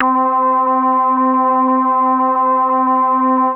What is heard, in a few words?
MOOG BASS C4; Best Service Dream Experience